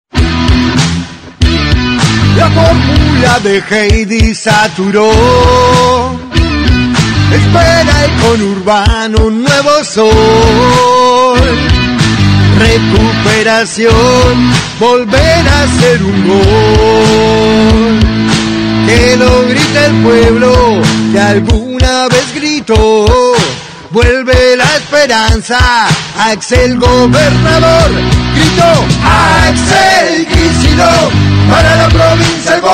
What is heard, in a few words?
jingle; kicillof; ataque ochentoso para visualizar su campana